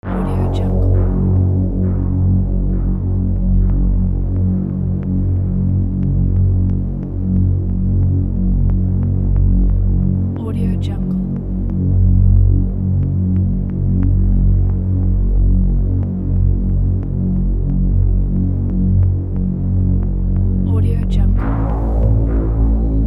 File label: dark halloween